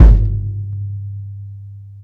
Kick, Hip-Hop, Drum Kit

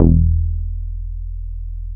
Bass Long E; VEC1; Club; Long Basses

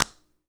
Clap

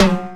Timbale, Hip-Hop, Drum Kit

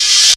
Reverse Cymbal, Hip-Hop

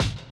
Kick, Hip-Hop

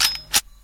Click Clak; RnB

